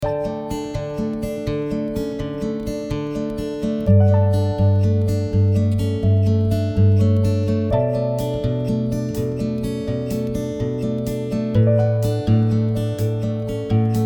mellow acoustic folk

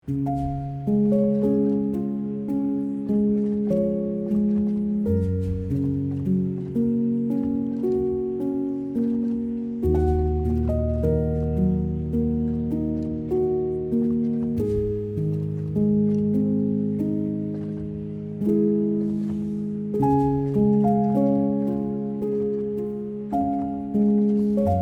relaxing piano music

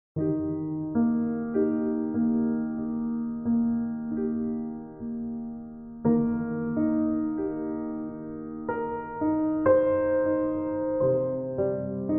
piano music, classical

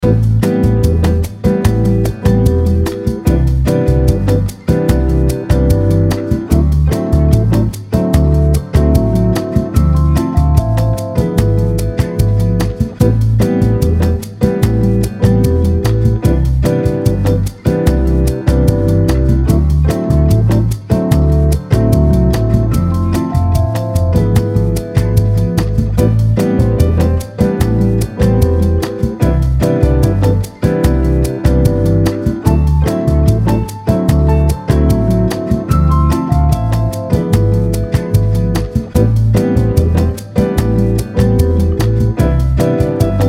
jazz lounge elevator music

